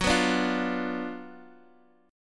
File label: F sharp Major 7th Suspended 4th Sharp 5th, Guitar Half Step Down